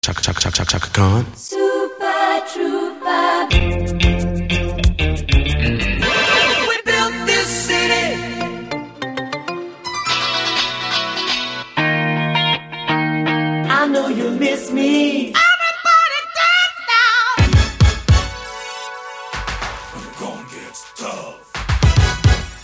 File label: retro jingle